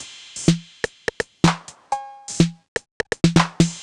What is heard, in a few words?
perc hotwire 125, Percussion Loops, Classic Chicago House